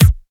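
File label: HIPHOP bass drum; s950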